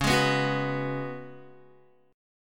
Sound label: C sharp 7th Suspended 4th C sharp, Guitar; C#7sus4; strummed; JGuitar